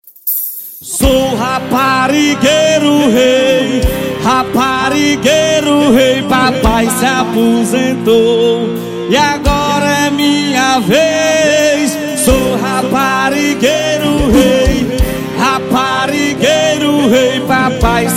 guitarra forro

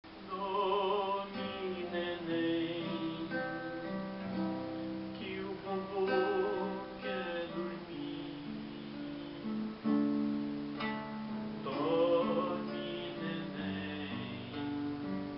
dorme nenem que o vovo quer dormir cancao de ninar viola

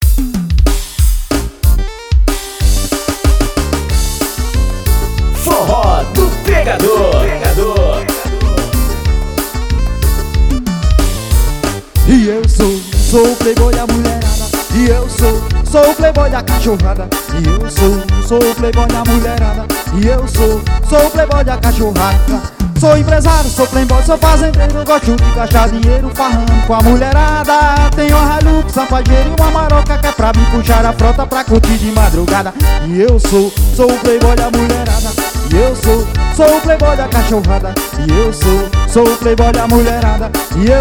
forró

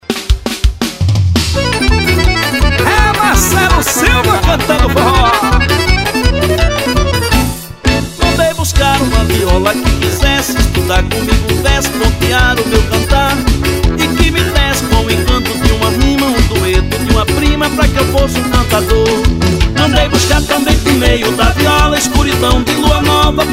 viola

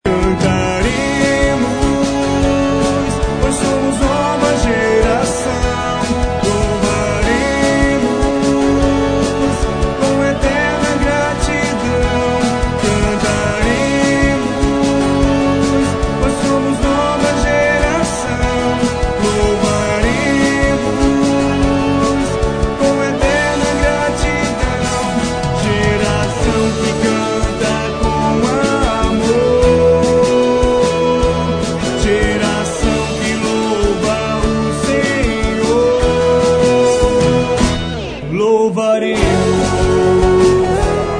toque celular

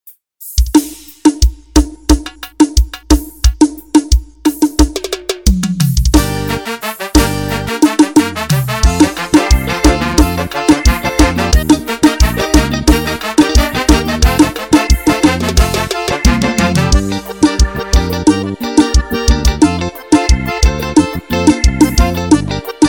teclado playback

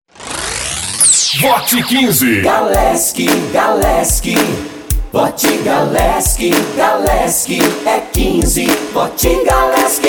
JINGLES; vote galeski prefeito

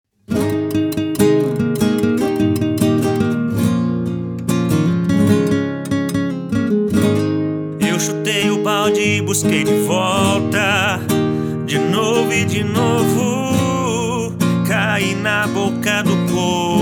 sertanejo universitario

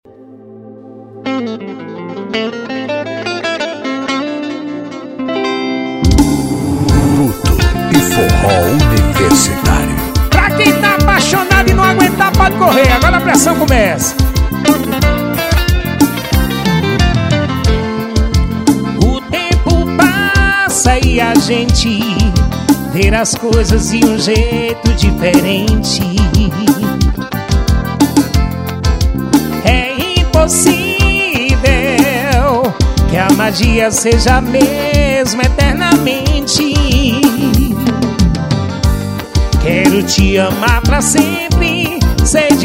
forro universitario; nill studio producoes musicais

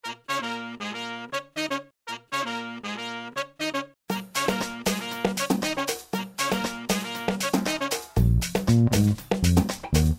trilha clima; trilhas para filmes

